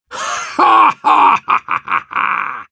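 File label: laugh evil, Russian; Demoman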